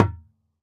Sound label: Bebarongan, Percussion; Roland BK-5